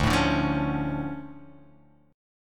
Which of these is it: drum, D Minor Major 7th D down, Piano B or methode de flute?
D Minor Major 7th D down